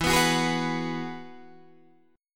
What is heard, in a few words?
E Major Add 9th E down Guitar Open D